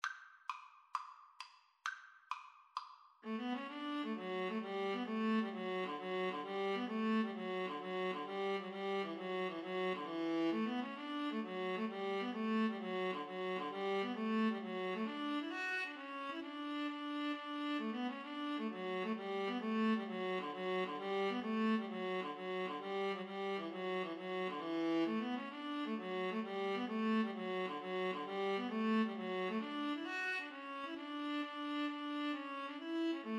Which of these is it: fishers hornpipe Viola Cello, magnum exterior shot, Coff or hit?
fishers hornpipe Viola Cello